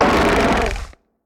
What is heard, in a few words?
HAMMERHEAD attack